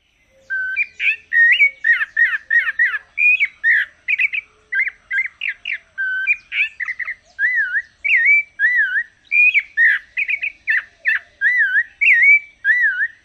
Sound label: song; TX